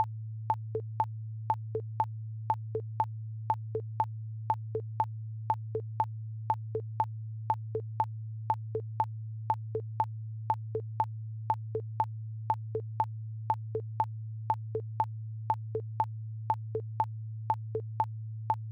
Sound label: trailer bed